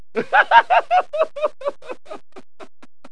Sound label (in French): rire; iWave Interactive 01